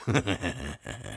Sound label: laugh evil; Engineer